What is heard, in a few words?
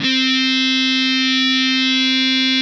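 fuzz, fuzz guitar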